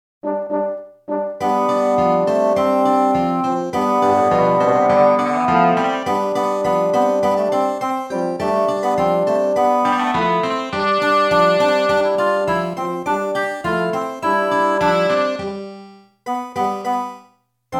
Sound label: Comical